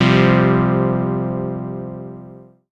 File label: SYNTH